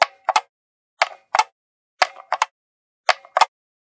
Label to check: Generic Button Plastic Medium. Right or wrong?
Right